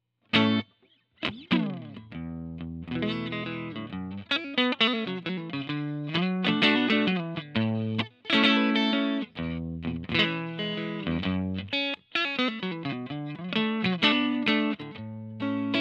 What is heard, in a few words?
Front Clean, guitars